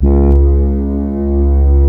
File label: C#, Synclav FM, BRASS; Big Fish Audio